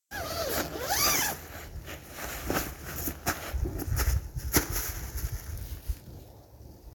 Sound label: Opening the tent